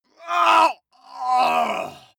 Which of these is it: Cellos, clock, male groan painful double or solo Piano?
male groan painful double